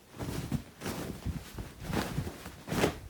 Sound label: Wrapping Cloth